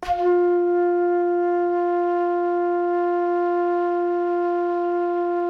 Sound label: FLUTE FF F4; Greytsounds; Philip Wolfe David Crozier's Saxophones